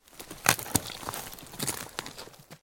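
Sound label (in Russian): осторожный шелест и хруст веток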